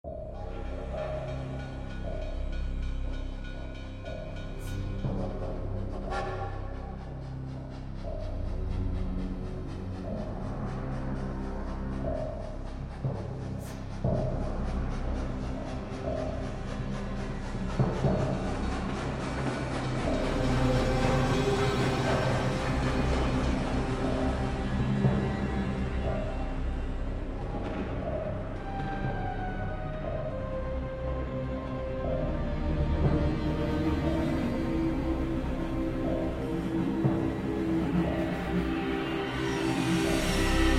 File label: field ambulance music; painkiller overdose